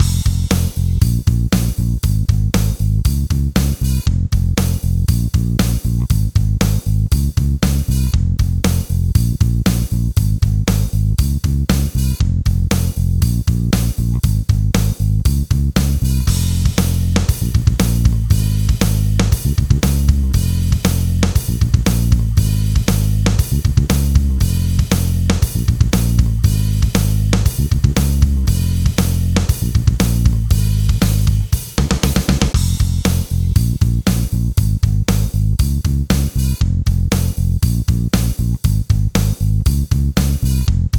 Basse Batterie

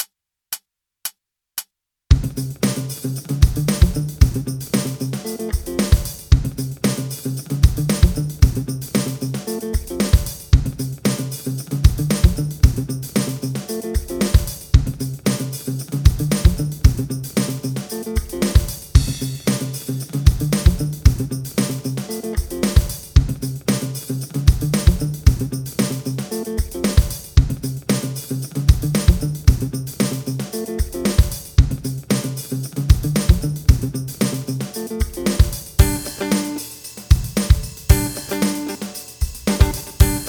Batterie 114 Bpm

